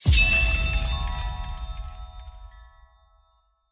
Ui Menu; Archeland

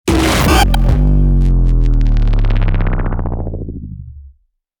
PLAYER DEATH STING 135BPM 4-4 STING F#; Arca's Path VR

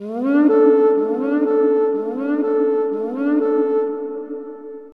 Horn of Truth; Dinosaur Planet; N64

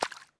Foot Wet Puddle Run

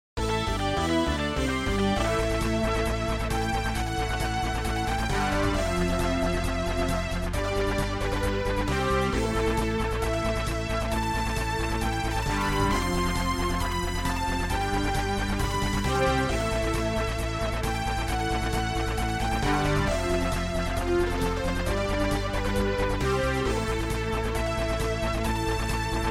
MIDI Battle

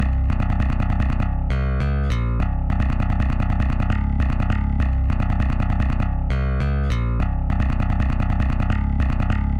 Drums and Jingles Evil Bass D

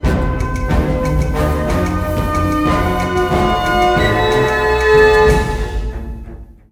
music jingle tension; Jack Keane 2 The Fire Within; Windows gamerip 2012; africa mountain

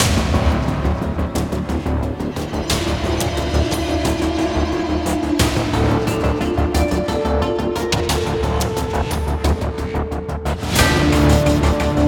bgmusic medium combat; Iron Marines

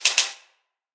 Bar Graph Inc Type; Prison Architect

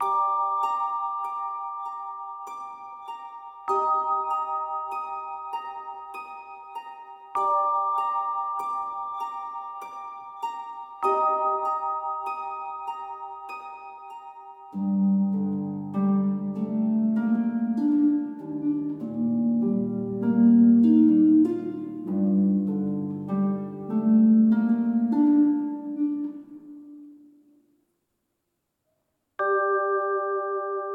House Boat - Ambient, Harp and Vibes Stem; ps4 ps5 switch windows xbox one xbox series xs gamerip 2024; Open Roads

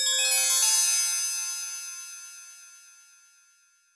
ui unlock; Marble It Up Ultra

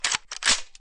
Rifle Reload; MilkChoco

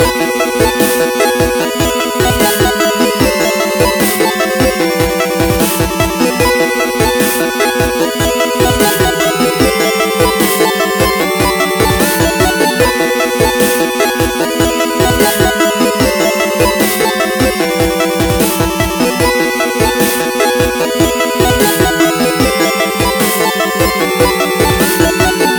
Blip Break; Levels+: Addictive Puzzle Game

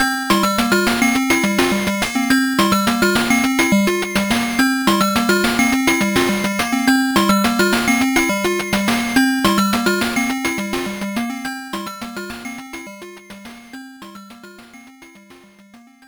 Victory; Toy Story 2 Woody Sousaku Daisakusen; Pico

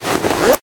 Stab Vest Wear; Prison Architect

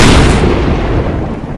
Skill Effect; MilkChoco

